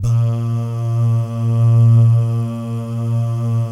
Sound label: BAH GUY, Vocal Planet Jazz & FX; ILIO; BAHS GUYS